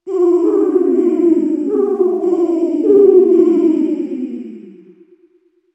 moans

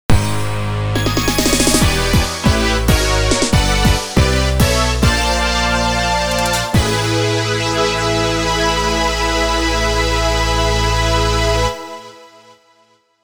All Clear; Tobi Tsukihime

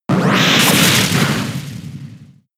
High Jump Kick; pokemon sfx gen 6 attack moves xy oras

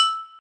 Dink; Pocket Planes; android

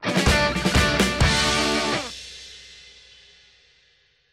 Small Celebration; Disney Infinity

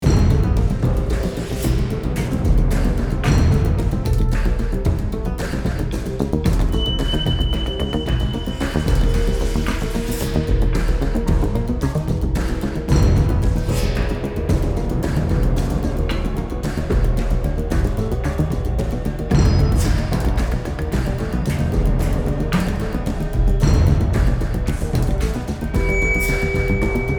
Action loop

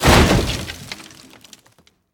Hiding Spot Destruction Empty; The Inn

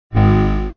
took damage; Bubble Tanks 3

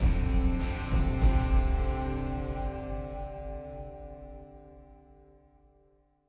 Boss Walk; Naan

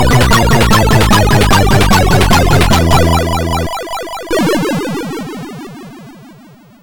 Jingle (Fast); No Second Prize; Atari ST

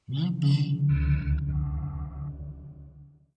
whale

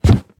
Jump Wood Jump; Devour

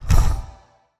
Shield Block; portal quest; android; Shield Minion

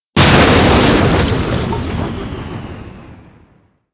Ufo Destroy; Angry Birds Space

